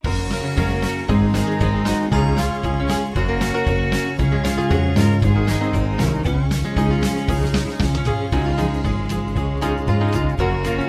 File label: Low Key